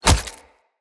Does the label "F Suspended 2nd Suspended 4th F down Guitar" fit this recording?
No